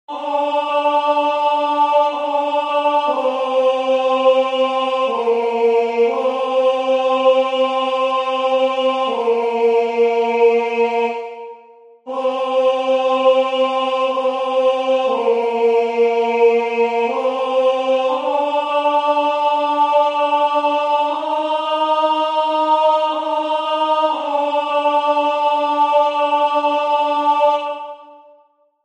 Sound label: Tenor, chorale; Graduale